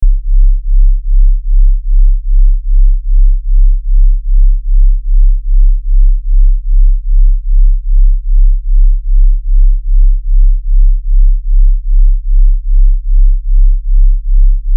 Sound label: binaurals chill